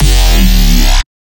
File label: Bass Shot X Groan, Dubstep; Ghosthack; Advent Calendar 2018